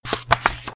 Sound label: Paper Flip